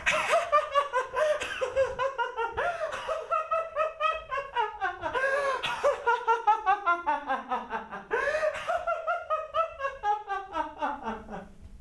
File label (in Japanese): waraimawaru; 笑いまわる; 笑い狂う(立体)